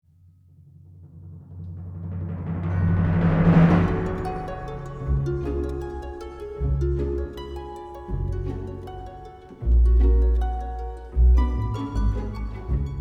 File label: Mysterious Magical Suspense